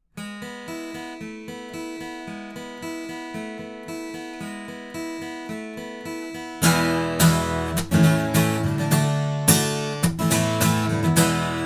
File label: Sontronics Helios omni guitar